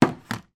household plastic clothes iron put down on hard surface